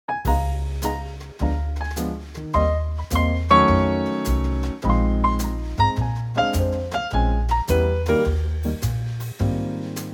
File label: Ab Trio